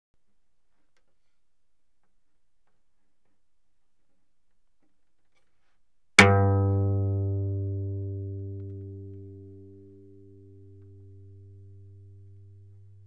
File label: Cello pizz ff sul G G2 B2; Strings